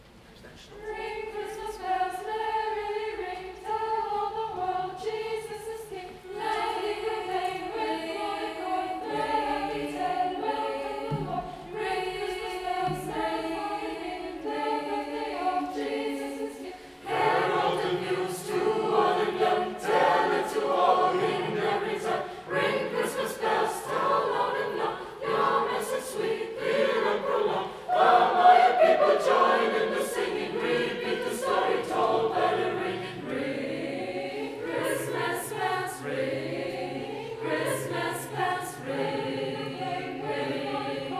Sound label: Ring Christmas Bells